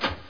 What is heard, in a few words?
CLUNK